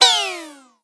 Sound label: Emoticon Motion Sad; blue archive